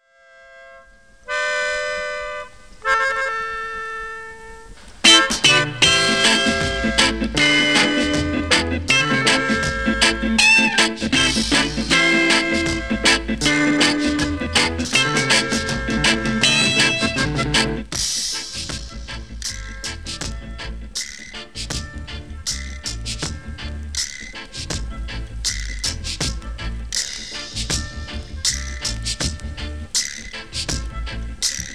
JAZZ

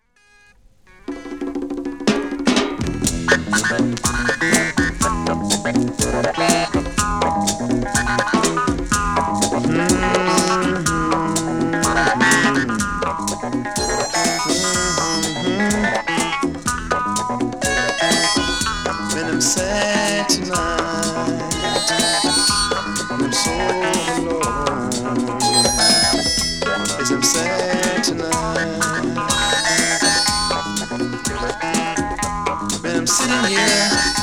BONGO

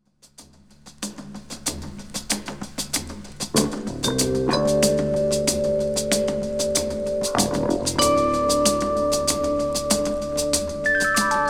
GONG

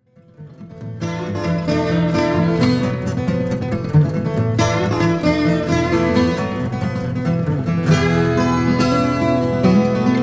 music

